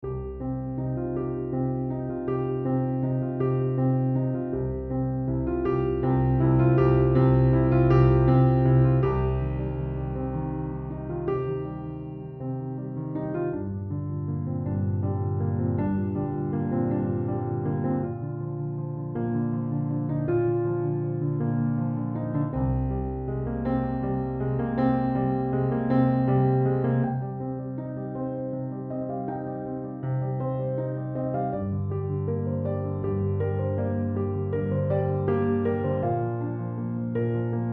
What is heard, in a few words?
theme piano